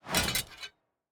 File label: ui battle preparation